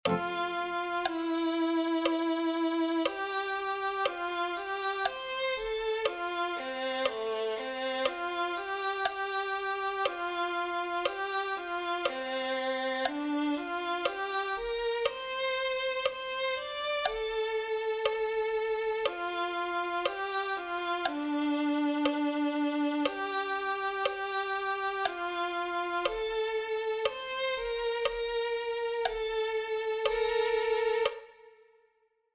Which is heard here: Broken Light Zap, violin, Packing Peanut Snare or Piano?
violin